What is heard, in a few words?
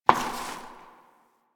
Step Perforated Metal; Warframe; Koumei